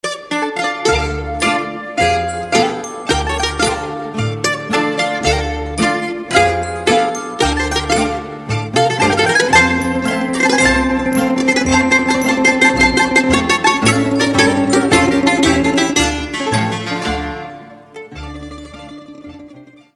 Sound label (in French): Chasapikos - Grece